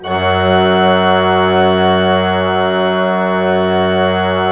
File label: F2, Cathedral Organ, PLENO; Propeller Island; PED.PLENO